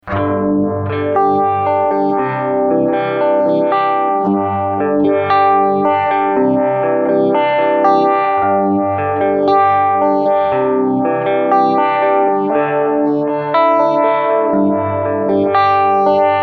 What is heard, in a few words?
mild chorus